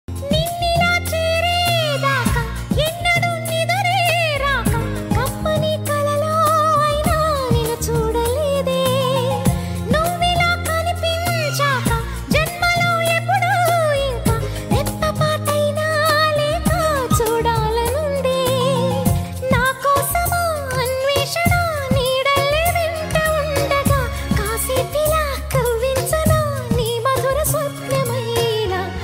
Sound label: telugu love songs